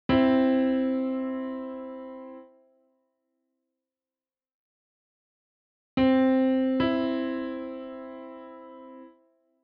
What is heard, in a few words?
interval